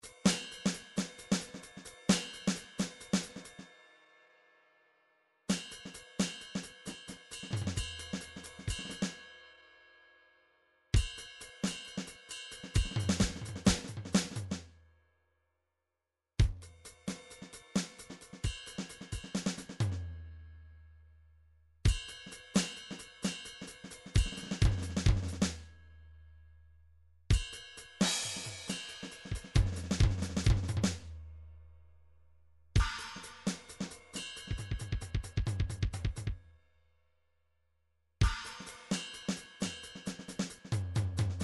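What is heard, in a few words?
drums jazz pattern, midi; Paul Kodish Dangerous Drums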